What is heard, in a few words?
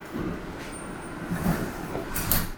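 door close, Train; OpenBVE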